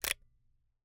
Camera, Kinusa K77, 50mm lens, plastic, serrated, lens adjust; Eiravaein Works; Vaeyan IV